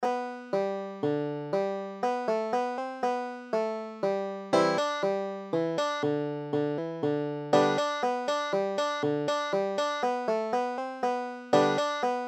g, banjo

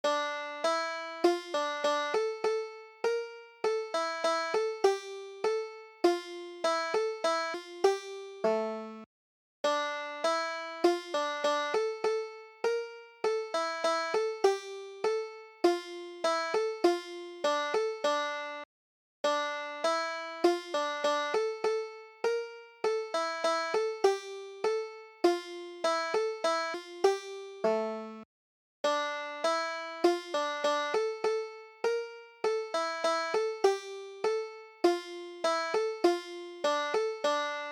bonnie prince charlie, banjo

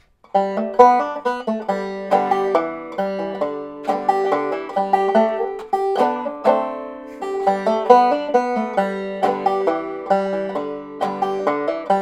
shove the pigs foot, banjo

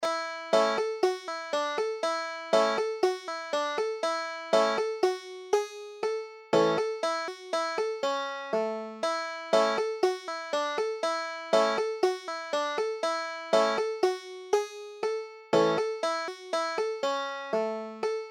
chinquapin hunting, banjo

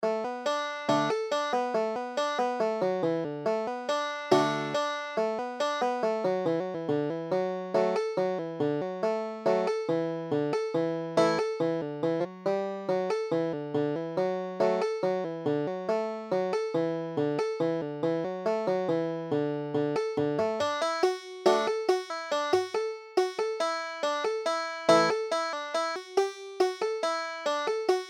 bonapartes retreat, banjo

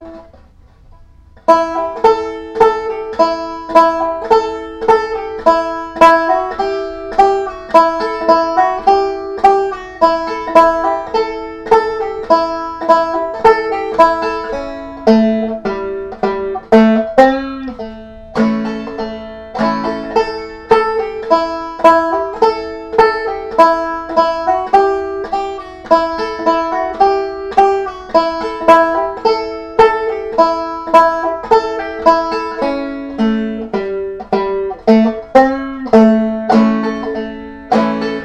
june apple, banjo

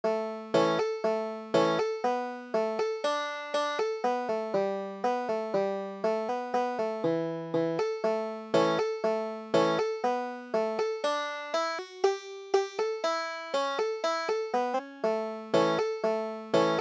salt creek, banjo

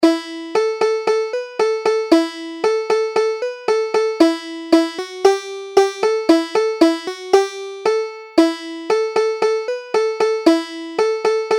hobarts transformation, banjo

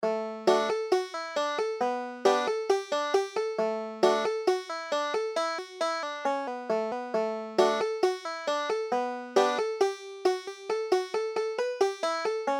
banjo

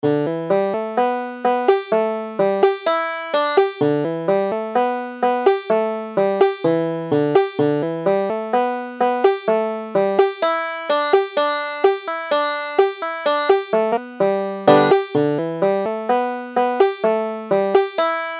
sandy river belle, banjo

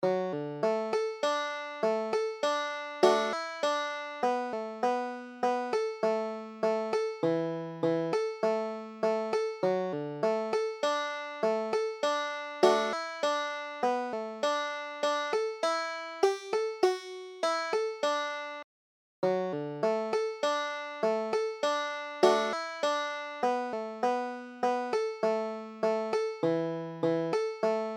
banjo

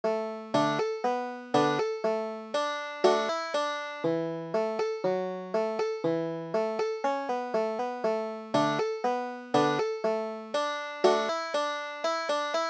sarah armstrong, banjo

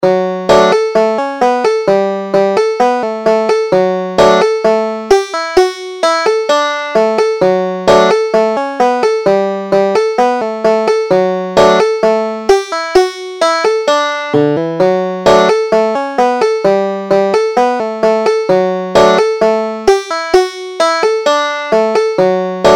dogs in the dishes, banjo

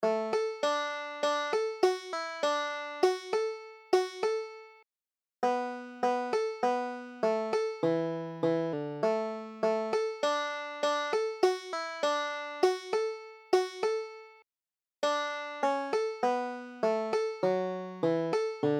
duck river, banjo

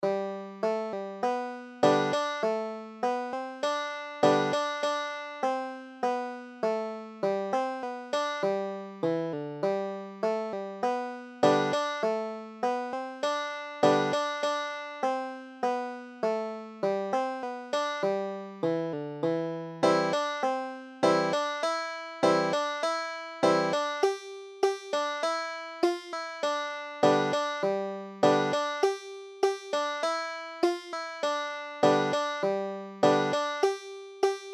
banjo

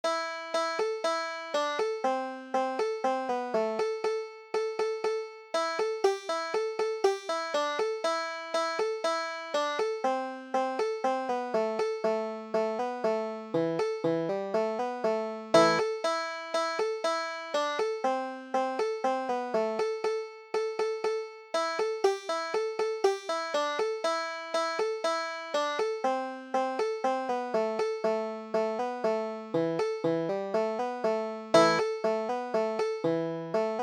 elzics farewell, banjo

